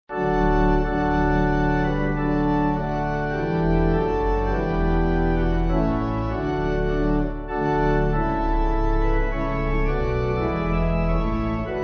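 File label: Pipe organ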